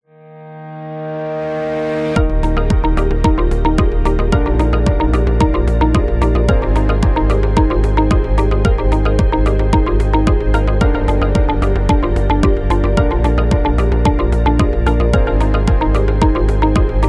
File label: Inspiring Business; corporate music vol 21